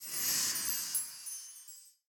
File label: dazzle Anticipation Cool Down; fortunerangers